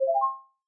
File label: match